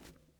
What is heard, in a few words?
wood jump